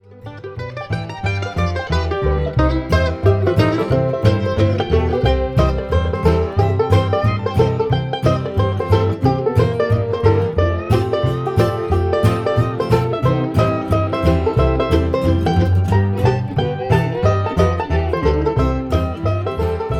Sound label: Jamming In C, banjo, bluegrass